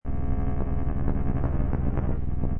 Space Scifi Sound Deep Core, Sci-fi spaceship atmos; core meltdown, slightly distorted pulsating and disturbing; Lynne Music